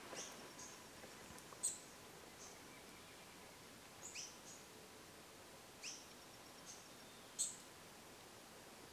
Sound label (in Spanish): picochato enano